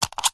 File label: foley clip plastic clip unclip